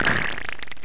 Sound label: player duct